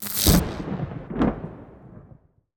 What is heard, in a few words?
Magic; portal quest; android; Antihero Skill1